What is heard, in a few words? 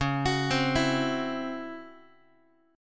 C sharp Minor Major 7th Double Flat 5th, Guitar; arpeggiated; x,9,9,10,8,x; 4A,4E,5A,5D,5G#,6C#